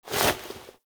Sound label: Foley Misc; Warframe; Operator